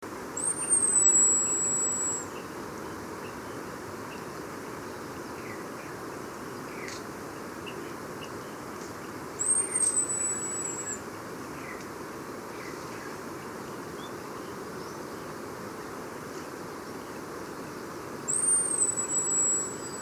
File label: barred fruiteater